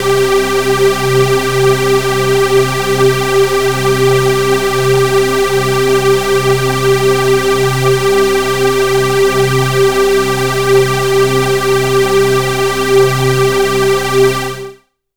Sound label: DIGI LEAD, Synths